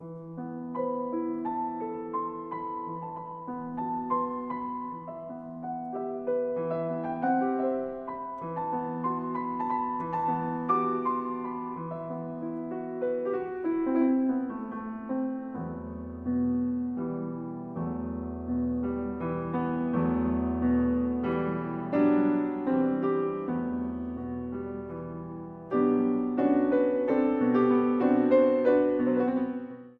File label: Piano Trio Allegretto